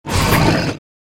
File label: Tiger Block